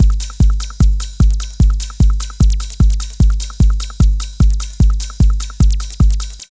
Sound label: LOOP, Tekno Industrial; East West